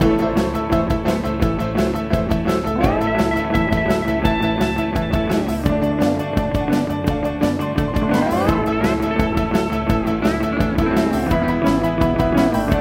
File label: Groove Loop; Stitch; Disney Move; ps2